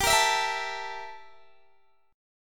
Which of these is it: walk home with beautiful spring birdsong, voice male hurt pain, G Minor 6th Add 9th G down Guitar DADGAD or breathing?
G Minor 6th Add 9th G down Guitar DADGAD